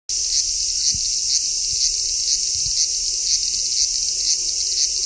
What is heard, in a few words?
aburazemi, cry of insects